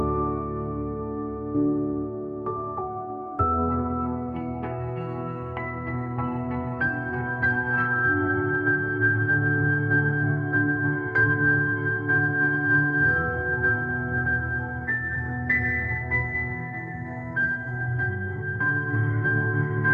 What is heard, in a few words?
ringtone emotional